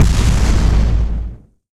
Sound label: weapons explosion large; FMOD